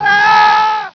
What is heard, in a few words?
fall; blockofighter